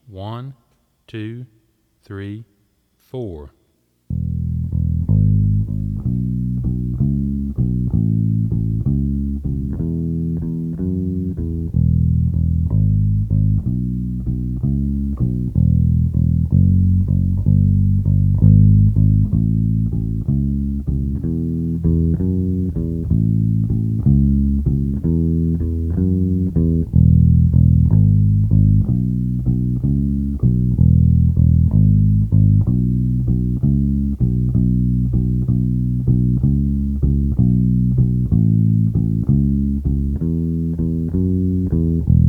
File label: Walking Shuffle In E Slow